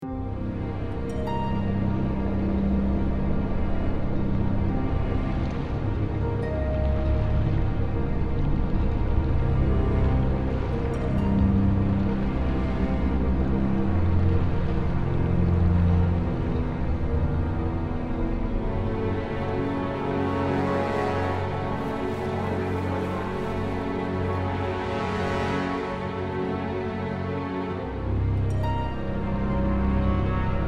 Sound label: race; Galactic Civilizations II